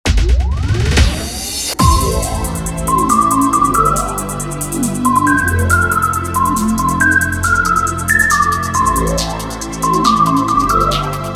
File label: the whistle song